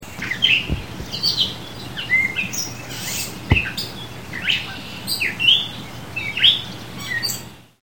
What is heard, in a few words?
Gray Catbird